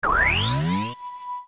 Arrow Select Light; zelda; OOT

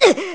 Hurt; Link getting hurt (standard)

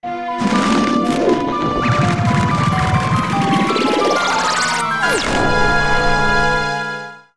Gale Warp Out Stereo

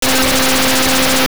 Shock; Plasmarine electrifying (longer version of the Link Shock sound); Oracle

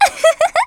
Laugh; Princess Styla laughing

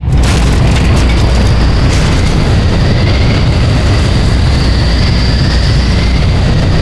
Pirate Ship Rise; The ship carried into the air (exact looping point unknown, but not needed since this sound fades out before completi; Zelda

